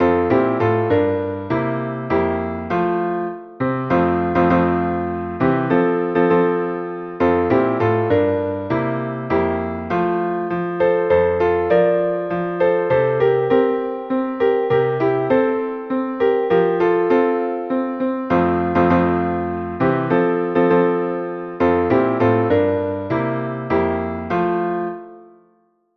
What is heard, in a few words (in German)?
Oh Tannenbaum Klavier